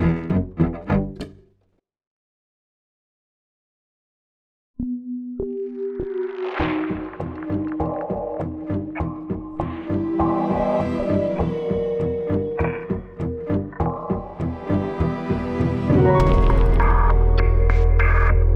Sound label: trailer music